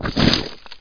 Crunch; Buggy; PS1

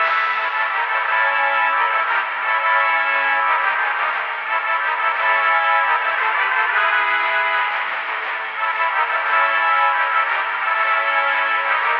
find opponent search music loop

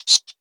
rat sqeek, rats

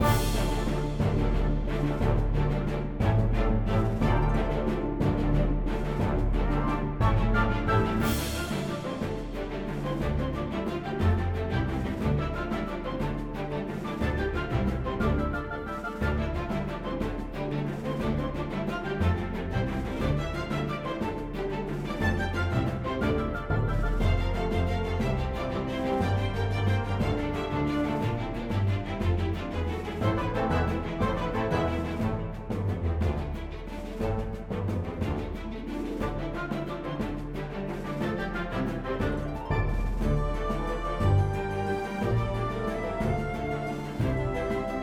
battle big pirate; Shadowhand; macos windows gamerip 2017

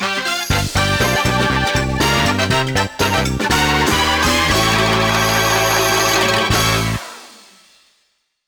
Mini-Game Winner; frogger ancient shadow

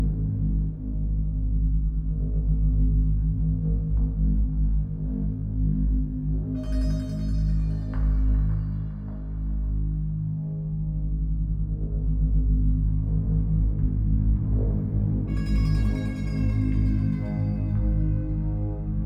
MX Deep Underwater; burly men at sea ios macos ps vita ps4 switch windows gamerip 2016; The Selkies

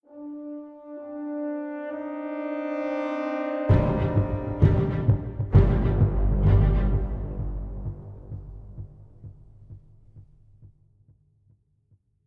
String; assassin s creed unity gamerip 2014

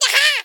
rat victory; ice age scrats nutty adventure

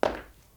Marble Foot Step

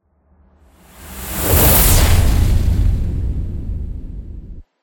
Transition; everspace 2

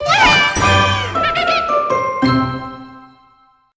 Crunchy Finish

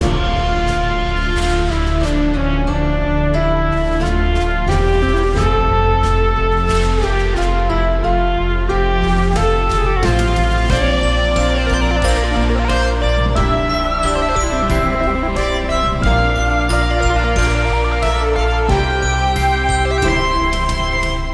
Results Death Loop; angry birds transformers 2014 ios

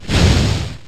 Dragon Breath

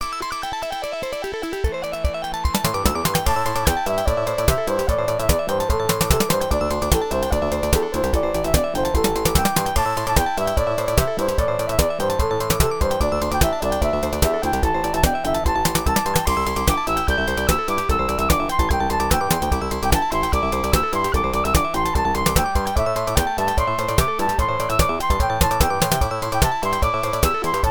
BGM (Fast); Block Block; arcade

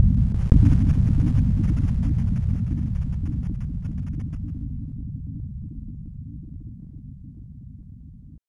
Distant Explosion; pc-98; Grounseed

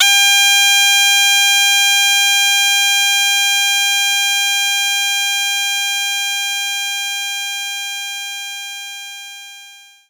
Shenai Ad3

